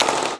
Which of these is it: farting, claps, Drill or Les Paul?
Drill